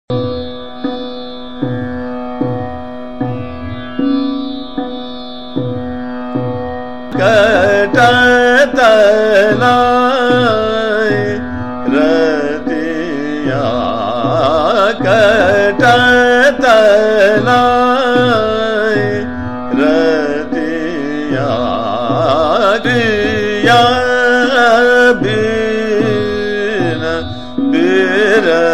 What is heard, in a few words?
Sadra, Jhaptal; Katat Nahi Ratiyan